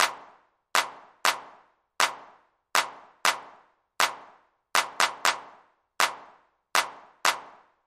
Ambient House Claps 120BPM

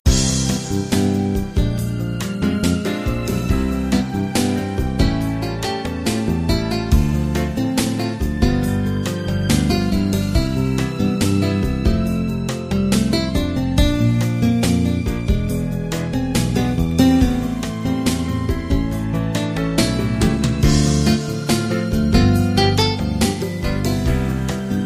bossa; archaea

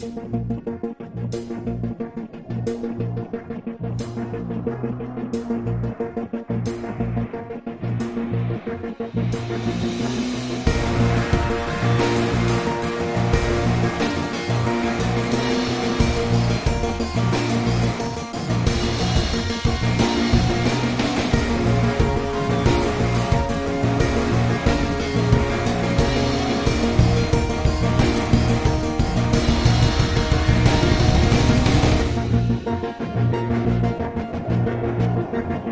Alt Percussion

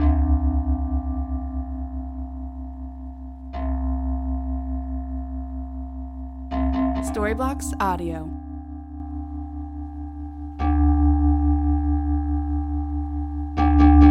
Ambient House Bell 136BPM C

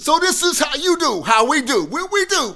Rap Vox

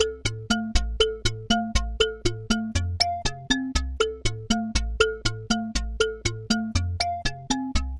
Ambient House Percussion 120BPM

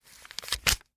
money bills counting in hand

